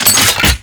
Secondary Mech, Weapons; Kuva Hek

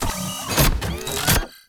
Rocket Turret Open; Venus; Turrets; Auto Agent Rocket Turret

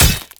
Ghoul Devourer Melee Impact

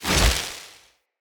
Casting Device Cast Left; Weapons; Player

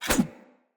Large Dagger Whoosh; Snc Large Dagger

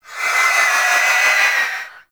Sticky Ink Vocal Call; Shadowgrapher; Mutator

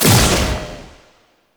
Proj Hit; Warframe; Lizzie

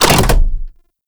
Hack Minigame Mech Action; Warframe; Duviri

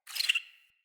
Amb Corrupted Critter Close; Ambience; Mendicant Area

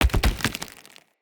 Mockery Body Fall; Enemy; Foley; Mockeries; Characters

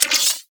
Multi Gun Overcharged Fire; Enemies; Abilities; Warframe; Corpus

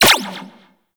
Shot, Bladed Pistol; Akjagara Prime; Warframe; Weapons; Tenno

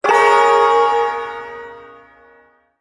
Ersatz Shawzin Phrygian Chord L; Warframe; Duviri Ersatz Shawzin; Chords

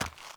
Walk Gravel, Footsteps; Characters; Enemy; Mockeries; Mockery

